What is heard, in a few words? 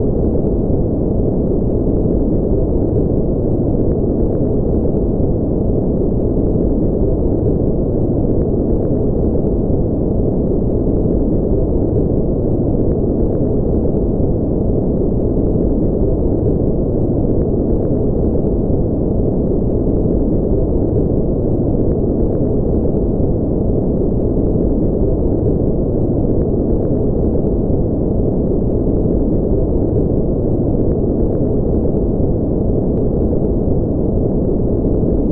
Pink Noise